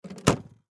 Harley Davidson Flhr Road King Close Side Compartment; Transportation Automobile; Motorcycle